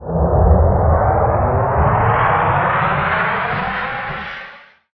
rocket ignite

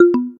Thumbs Down

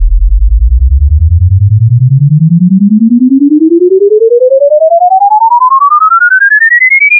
oscillator scheduling

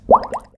fuel add

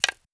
Pieces Single Placed

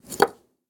Tile Flip

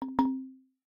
End of List

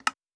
Settings Button

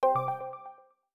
Winner

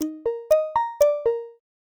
power on

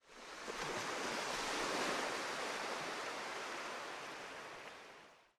ship slide

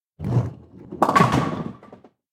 Bowling Knock Over Pins

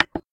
Nav Click

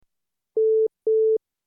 alert on call

